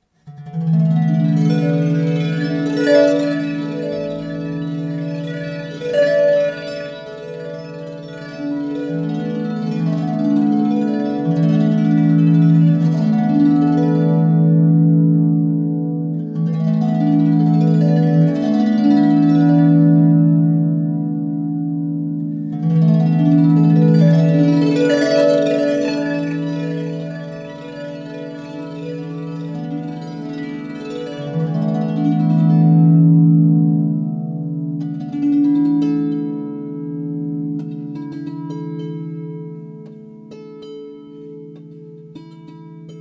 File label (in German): Klangbeispiel kleine Tao Klangkabine